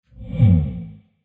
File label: Future Vroom, whoosh sound effect